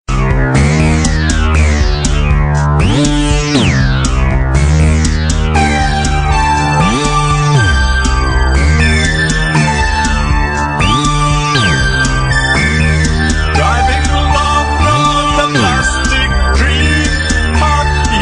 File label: electrojazz